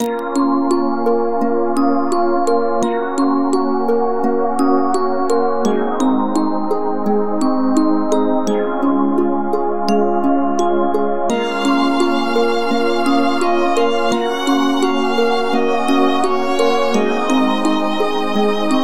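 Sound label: SAD, MIDI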